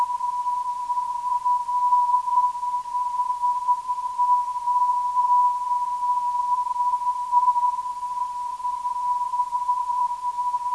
LHO 346kHz

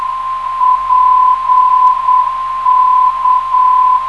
432kHz, ndb

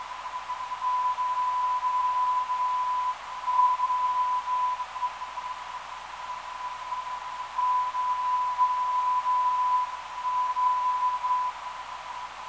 790.12kHz, NDB

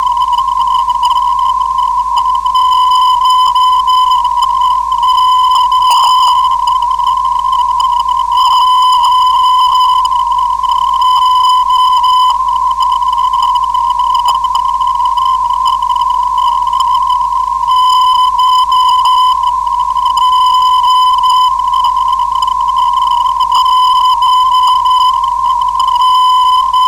297kHz, ndb

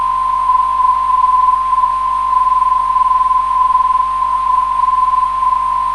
421kHz, ndb

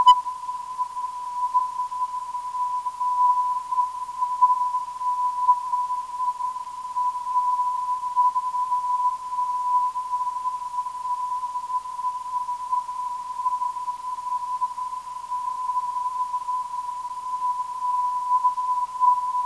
591.58kHz, ndb

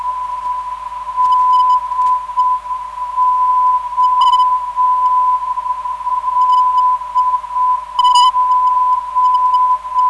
389.6kHz, ndb

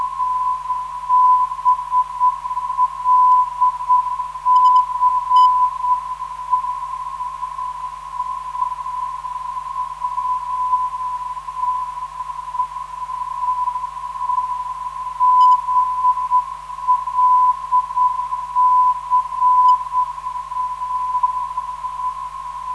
376.1kHz, ndb; BLC

